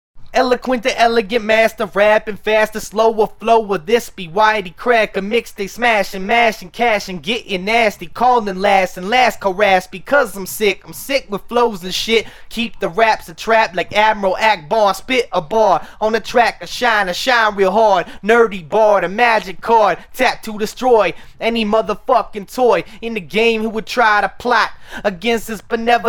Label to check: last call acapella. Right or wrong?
Right